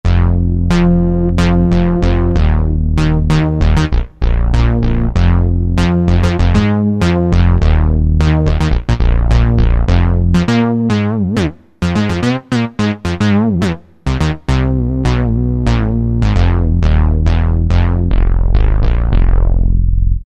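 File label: bass Syn, Yamaha TG500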